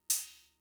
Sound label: Cymbal; Fricke MFB-522